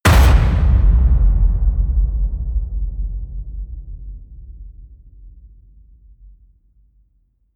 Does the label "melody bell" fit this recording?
No